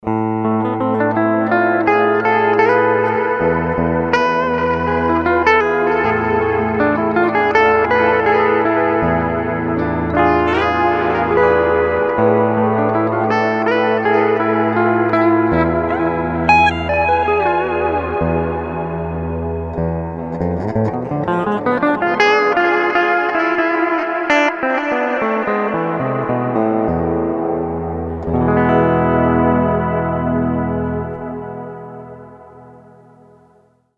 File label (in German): Modus Hall